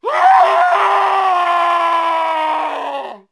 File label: ZOM DIE